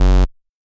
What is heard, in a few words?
bass